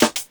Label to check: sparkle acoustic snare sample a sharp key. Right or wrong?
Right